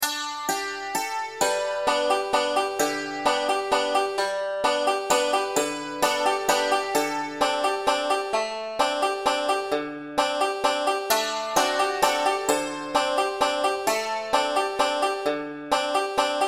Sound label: banjo; beginning 5 string; down in valley